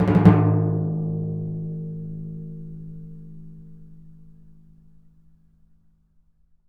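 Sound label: timpani A#, Synclavier Percussion; ILIO; Synclavier Percussion 2; World & Orchestral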